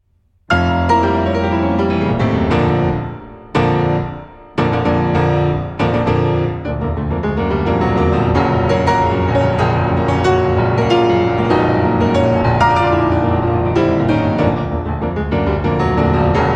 Piano Sonata No. 2 in B-Flat Minor L'istesso Tempo Allegro